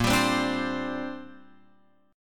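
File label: A sharp 6th Add 9th chord, Guitar, Open F tuning; strummed; x,5,7,7,5,7; JGuitar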